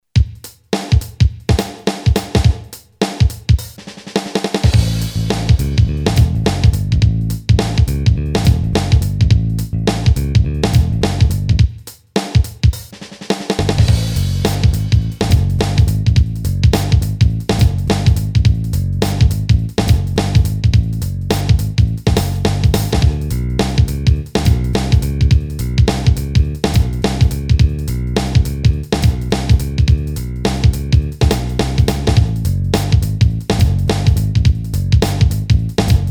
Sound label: ROCK JAM, Boss DR-3